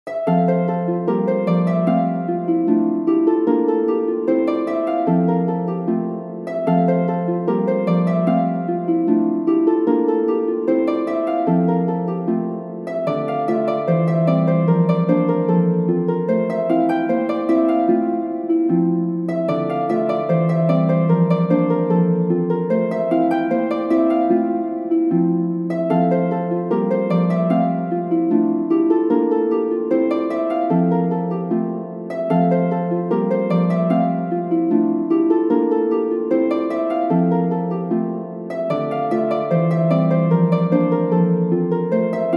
hornpipe

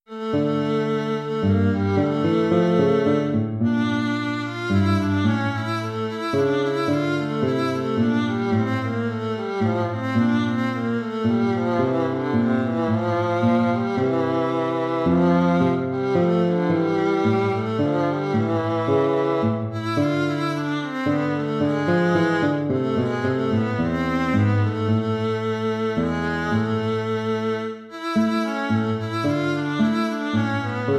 courante

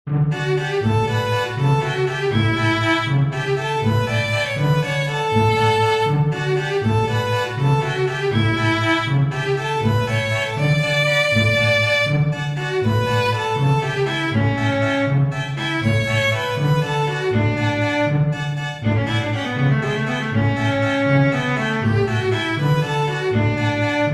string quintet

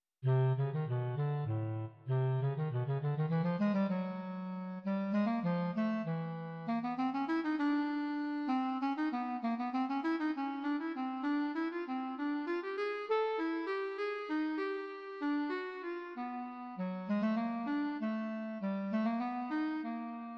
sketches for bass clarinet presto

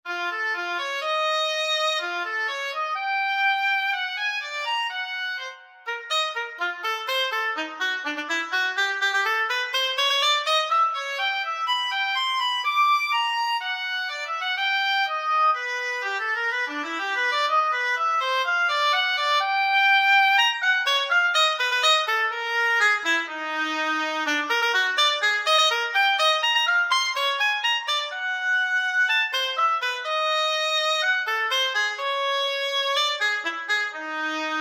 pieces for oboe allegro

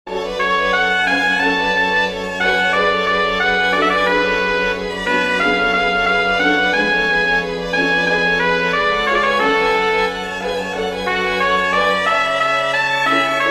recitative for trumpet strings; bach johann sebastian; hochteurer mann fahre ferner fort

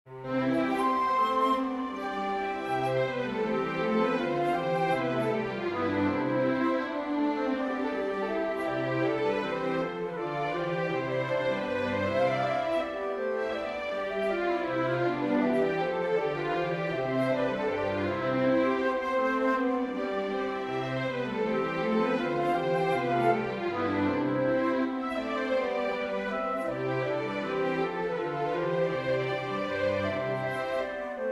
for winds strings; purcell henry; thus virgil's genius lov the country best

